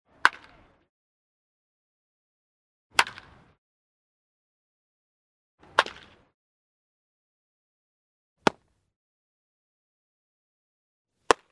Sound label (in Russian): bejsbol chistij udar